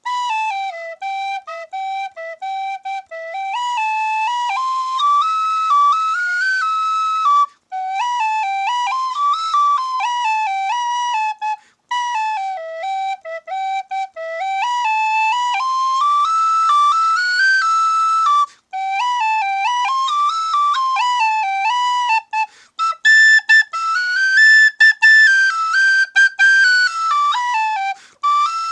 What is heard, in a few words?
Rolling Waves, tinwhistle